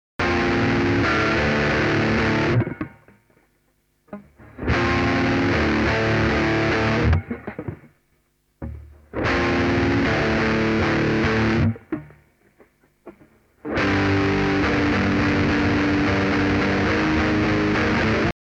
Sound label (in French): guitare satu